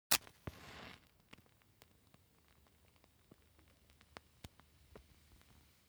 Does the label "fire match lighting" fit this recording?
Yes